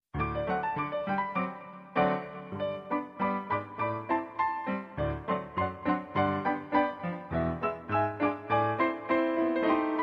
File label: polka